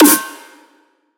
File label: Dubstep Snare (Deathstep); Ghosthack; Dubstep Sound Arsenal